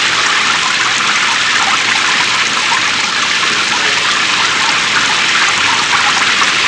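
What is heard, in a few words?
RAIN JUNGLE, NATUR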